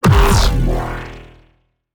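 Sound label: Sparks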